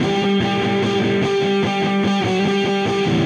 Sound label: Dirty Chunk Guitar, Indie Rock; Silos; Sonic Foundry (Sony Creative Software); Indie Rock Anthems; Session 11 - Silos